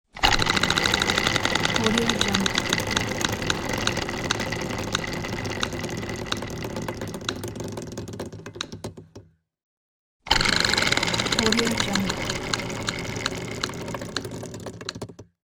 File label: spinning wheel